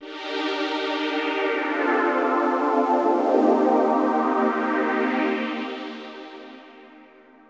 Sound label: SYNTH PAD AMBIENT 160 C, Ambient; eJay; Sphere